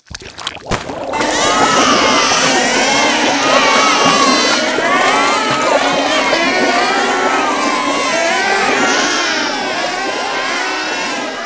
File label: baby Spurt